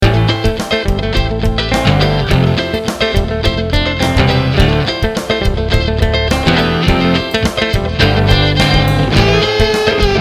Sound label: Funks